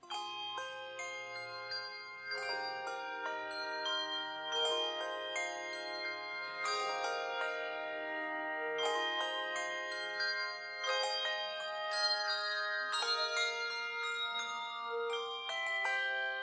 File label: Offertory, Carillons